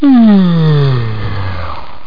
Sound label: yawn; 9,859 sample rate; Hmm...